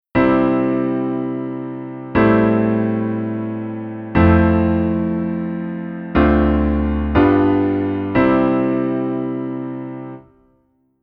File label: Chord Pad; ABILITY